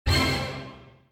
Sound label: prize hit; Criss Cross 81; wazdan